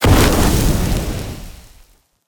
Sandball impact

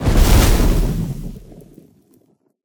Ignite

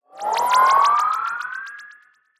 Recover

